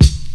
rich top end hip hop kickdrum sample c sharp key

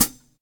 bright foot cymbal c key; hat; hat samples

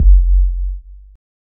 long tail reverb kickdrum F sharp key

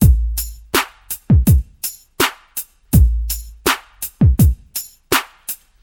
82 bpm breakbeat a sharp key; drum loops

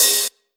wet open hi hat e key

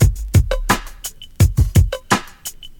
114 bpm drum loop f sharp key

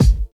00s present bass drum sample g sharp key

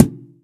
shiny kick drum single hit c sharp key; Mono

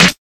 trebly snare sample a sharp key; snare drum samples

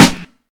wet snare single hit f key

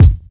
studio bass drum sample f key; kick drum samples

